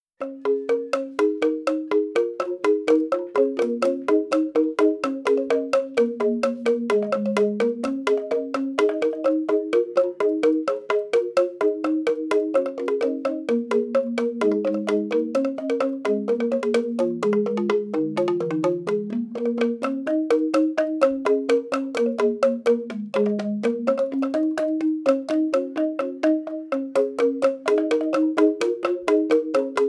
Jegog, Balinese; Various Balinese musicians

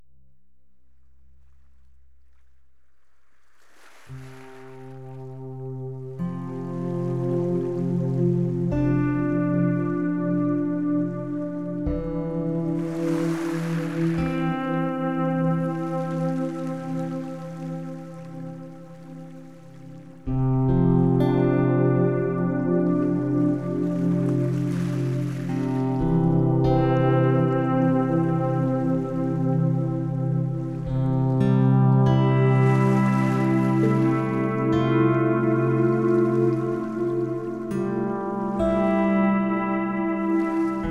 Heavy Rain Sounds

